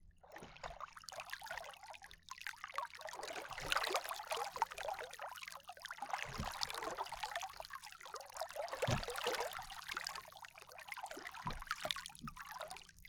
raft idle; VintageStory; survival

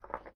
toggle switch; VintageStory

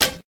bow release; VintageStory; survival

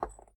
stone switch; VintageStory

